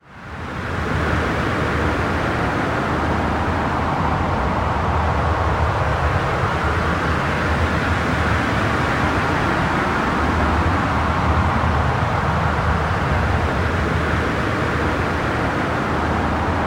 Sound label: Hurricane Winds